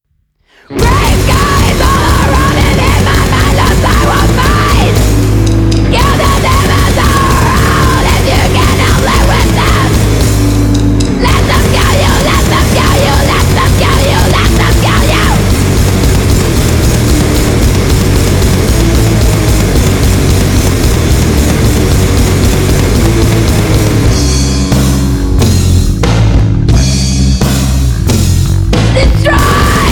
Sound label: Powersludge